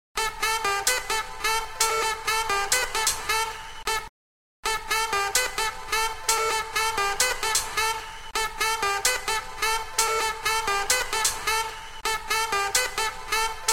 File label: Saxophone Sms